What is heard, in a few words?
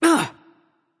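grunt hit, female; smite; voicepack; hera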